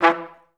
TR STAC E2; Best Service; Peter Siedlaczek's Advanced Orchestra

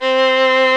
STR VLN, Str Quartet, Strings

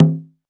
bongo; Quasimidi Technox

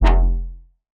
Bass F, Bass Synth

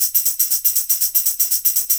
120 tamb; Top Ends; Zero-G; Monster Beats

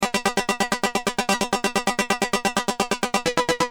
Keys, Industrial

